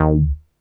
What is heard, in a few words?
NASTY BASS